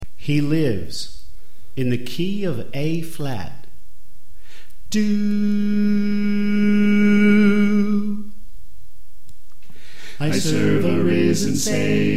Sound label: Bass Left